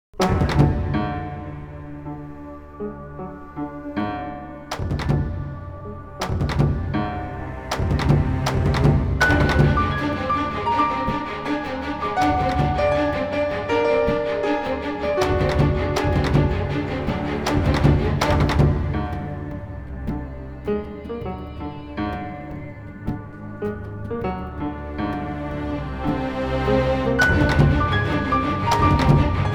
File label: Symphony Orchestra Percussion Ensemble; Shanghai Symphony Orchestra